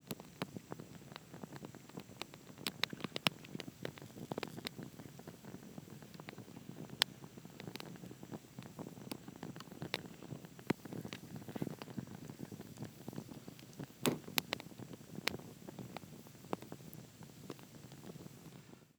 Ignite - Small Campfire; Gerald Clark Audio

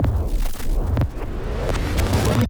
Rewind Transition; Gregor Quendel; Designed Interfaces

